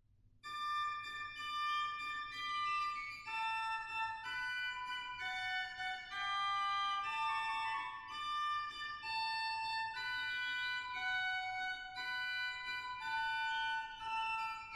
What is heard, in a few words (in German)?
Sedetz 1, organ; stade